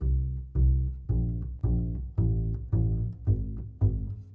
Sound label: rockpop bass pizzicato